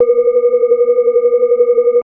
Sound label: energized; molecules and light; greenhouse effect